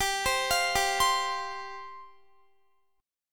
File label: G 7th Suspended 4th G down Guitar; Listen to G7sus4 arpeggiated; G7sus4 Chord